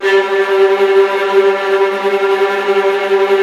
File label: STR TREMOLO, Strings